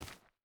footstep burlap stones run; Rust

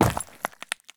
landing glass; Escape from Tarkov

